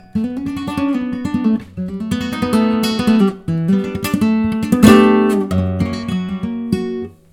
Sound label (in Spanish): falseta